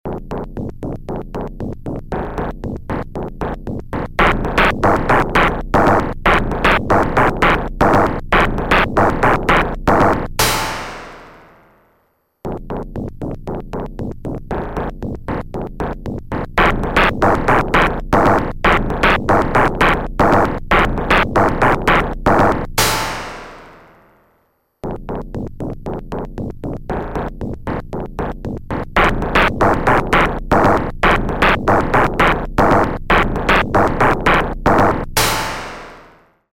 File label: Break